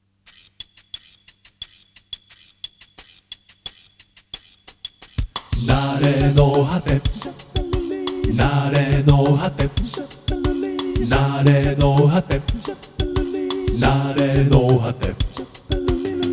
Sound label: Jingle (Bossa Nova 2); FM NARENOHATE Jingle (Bossa Nova 1) -2024 Mix- (Mono)